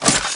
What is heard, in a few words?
gun open